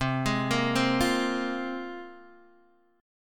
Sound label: C 7th Suspended 4th Sharp 5th, Guitar, Open D